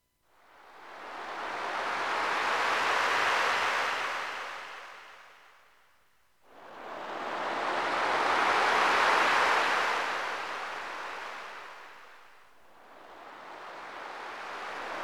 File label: Seashore relaxation